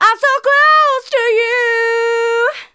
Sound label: Female vocals and robo-vox